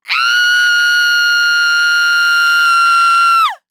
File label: Horror Scary Human Female Scream Blood Curdling Scream